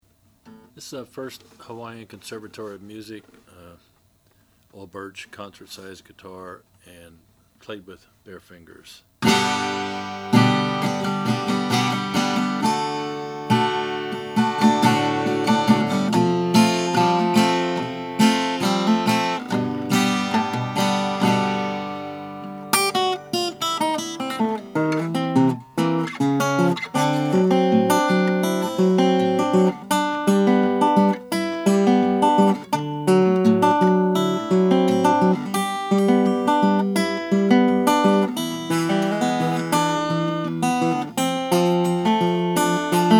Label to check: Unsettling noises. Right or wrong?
Wrong